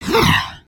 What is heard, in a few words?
idle, creatures organic